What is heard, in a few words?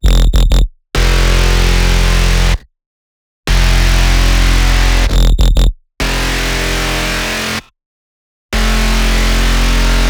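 Bass Loop F minor 95BPM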